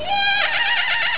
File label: trumpet